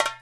FILL, Percussion; InVision Interactive; GRUNT

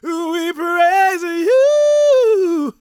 gospel male, vocal; ILIO; Vocal Planet

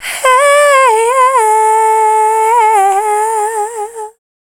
Dance Vocals, FEMALE

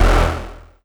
Synth Hit E

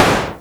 Generic Moog Snare

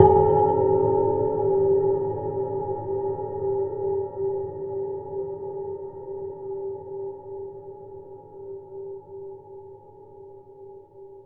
Shunt Slow one shot, Metal; Sonic Foundry (Sony Creative Software) - Ambient Atmospheres & Rhythms; Ambient-Metals

